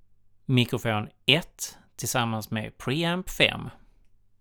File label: mic pre